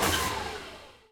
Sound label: Pilon Servo Big Stop